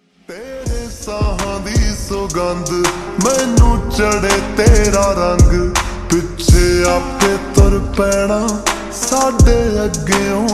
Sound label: Slowed Reverb Ringtone